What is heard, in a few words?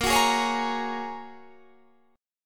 Bb Minor 7th Sharp 5th; Bbm7#5 strummed